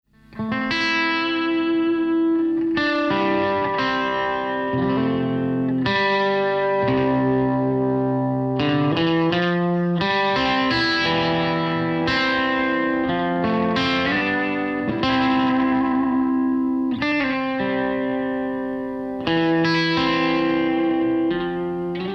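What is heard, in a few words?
TWANG GUITAR CLASSIC CHANNEL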